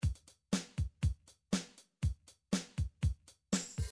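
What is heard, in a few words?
midi drums light rock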